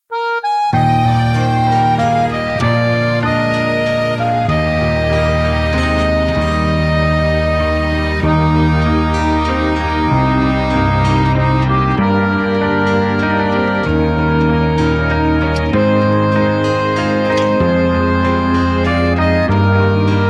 (Eb) No Backing Vocals

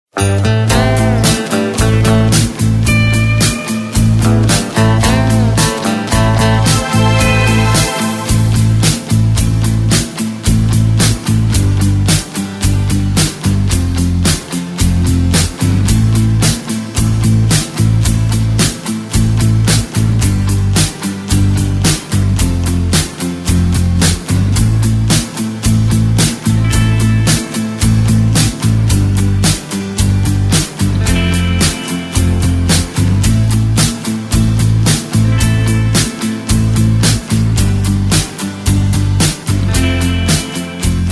Am No Backing Vocals

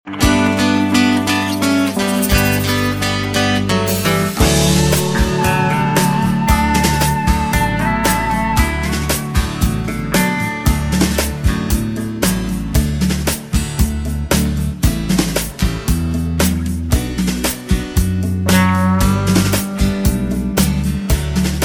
No Backing Vocals

